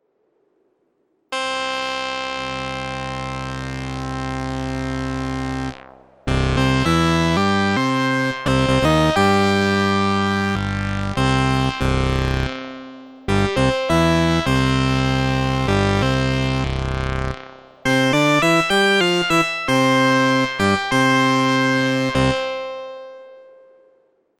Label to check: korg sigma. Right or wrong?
Right